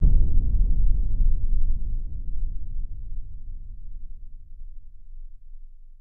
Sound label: Rumbling Low Pitch, space rocket